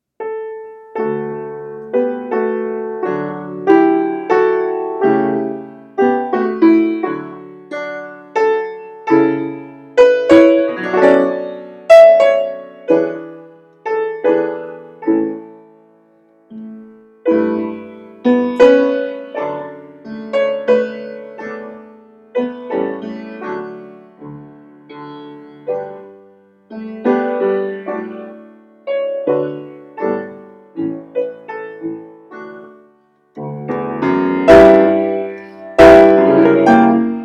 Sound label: piano improv D maj; Holiday mashup; D major; Thanksgiving Eve; Franz X. Gruber (1787–1863) of; Silent Night fame